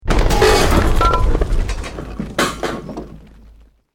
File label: BUILDING COLLAPSE SCAFFOLDING SMALL